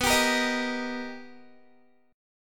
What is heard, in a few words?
B Augmented Major 7th B Guitar, chord; B+M7; strummed